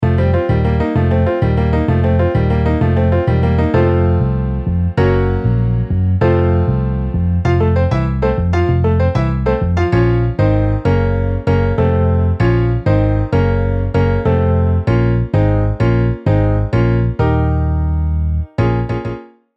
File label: Piano Bass MIDI